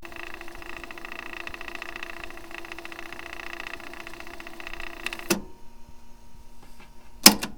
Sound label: rewind